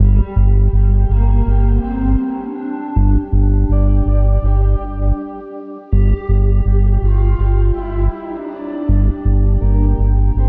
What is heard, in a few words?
Rebel Drone